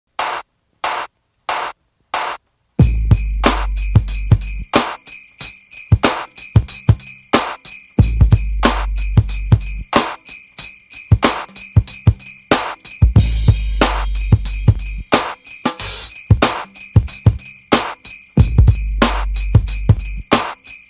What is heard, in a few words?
Attitude Beat